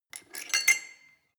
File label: weight bolt insert, fitnessstudio; sport freizeit